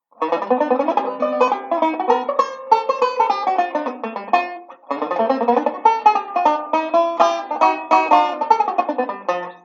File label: I V I Etude jazzy, banjo; Beyond Chord Melody Volume II